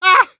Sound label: hit; Escape from Tarkov; scav6